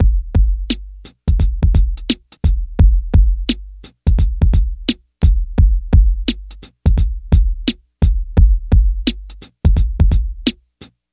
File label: DRM, Hip Hop and R&B Drumloops